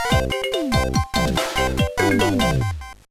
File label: Bonus Win; bomberman max 2; gba